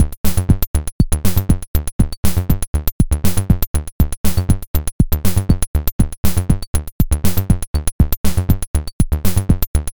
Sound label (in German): ALM Motto Akemie Microtuning Tonaler Beat 11 out of 19 TET